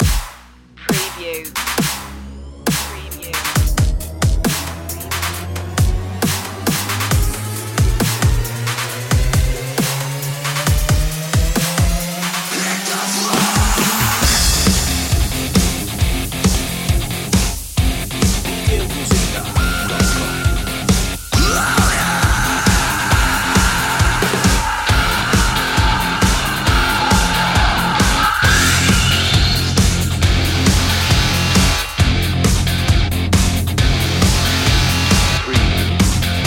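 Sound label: metal, Rock Hard / Heavy, raucous; adrenaline-fueled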